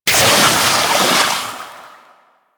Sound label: Infested Mist Fissure Closed A; Warframe; Infested Eris; Encounters